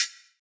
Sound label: Closed Hihat; Drums; One Shots; Cymbals; Humble Closed Hihat; Cymatics - Humble Hip Hop Sample Pack